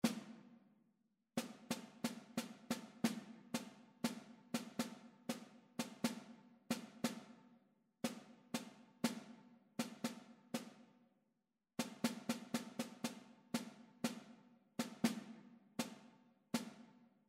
rhythmic error detection

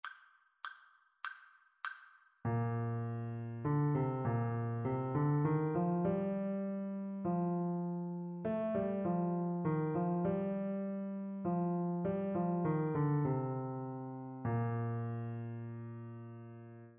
melodic dictation; ear training

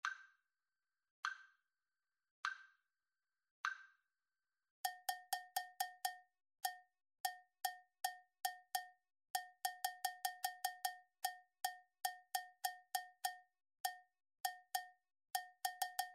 rhythmic dictation; ear training

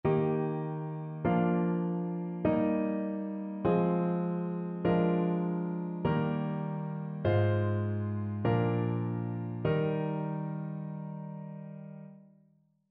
harmonic error detection

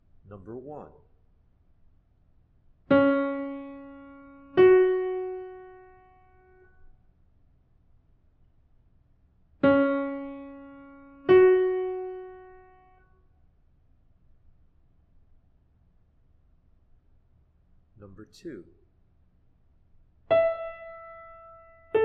intervals

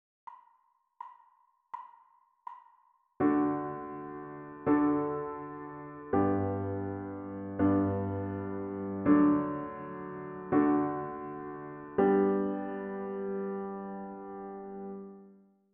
harmonic dictation

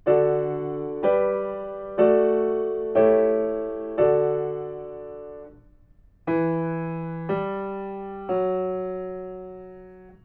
melodic fragments; ear training

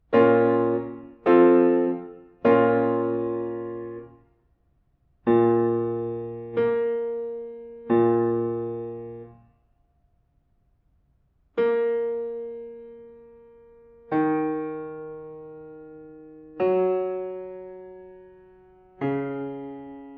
diatonic collections

